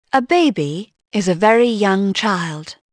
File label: baby; Dorling Kindersley; My First Incredible Amazing Dictionary